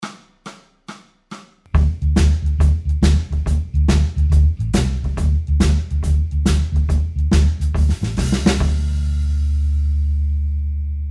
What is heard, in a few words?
Slide backing; Guitar Techniques 2017 Issue 274 October